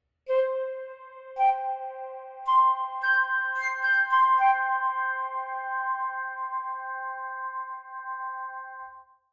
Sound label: Flute FX; SteingraeberE272